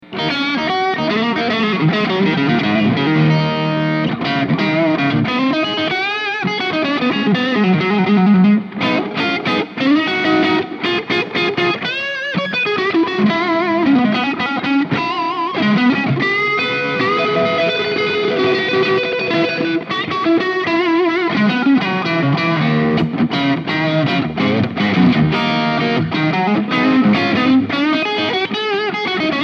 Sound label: dirty; RIC